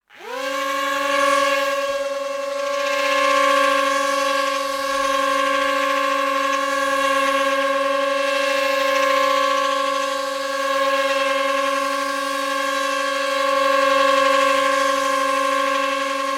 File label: toys drone or rc plane motors whir fly around close